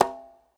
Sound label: reels stop; Beauty Fruity; wazdan